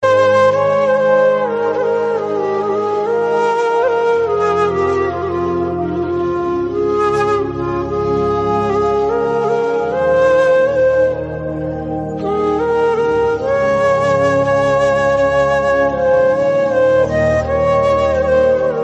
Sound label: Instrumental Flute Ringtone